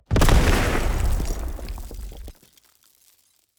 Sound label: zone explosion